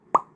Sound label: Bubble popping